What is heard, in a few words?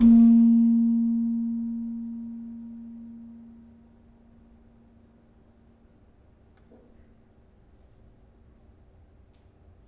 Slentem, gamelan